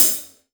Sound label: Real Drum Kit, JUNGLE KIT; Best Service ProSamples vol.43; Real Drum Kits